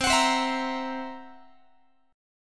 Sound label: C 7th Flat 5th C down Guitar; C7b5 strummed; x,20,21,22,20,21